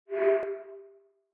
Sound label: powerup pick; kaliber